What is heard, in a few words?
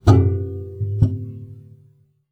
Classical Guitar Extended Technique